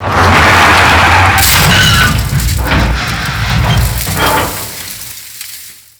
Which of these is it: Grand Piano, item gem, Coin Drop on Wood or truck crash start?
truck crash start